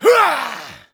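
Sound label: voice zip kick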